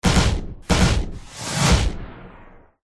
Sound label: science fiction blaster gun automatic shoot fire rounds; zapsplat